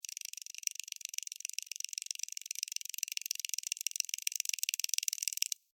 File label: Insect Run Cartoon, Insect, Run, Cartoon, Bug; Blastwave; Animals:Insects